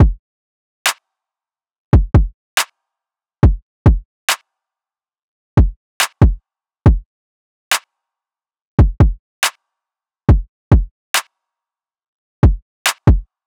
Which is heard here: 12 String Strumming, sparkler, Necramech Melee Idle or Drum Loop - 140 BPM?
Drum Loop - 140 BPM